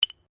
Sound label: Clap Sticks (Bilma) Small Single, Traditional Australian Aborigine rhythm sticks; Musical; Miscellaneous Musical